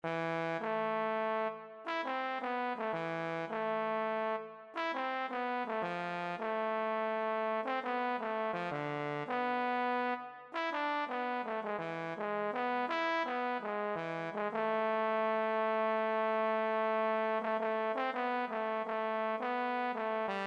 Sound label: euphonium